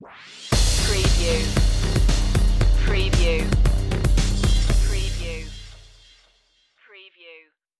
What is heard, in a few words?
new dawn stinger